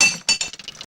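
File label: CLINK, metal impact; Total Control - Action Cutz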